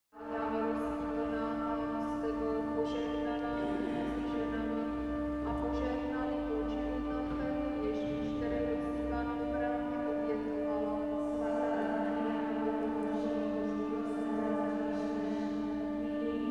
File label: modern chant